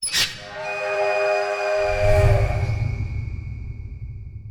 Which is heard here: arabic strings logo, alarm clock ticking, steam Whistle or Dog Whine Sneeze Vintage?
steam Whistle